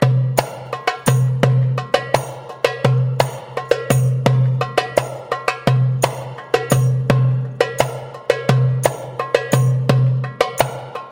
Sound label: Saidi